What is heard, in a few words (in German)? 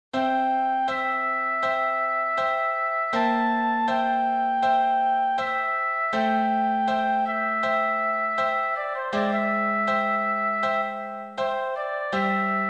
zwischenmusik